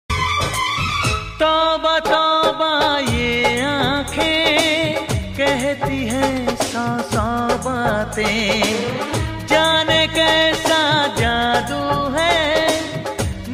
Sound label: Ringtone